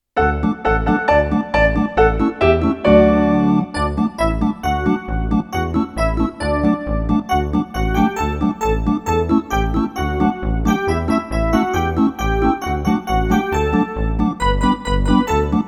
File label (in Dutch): wipwap zonder zang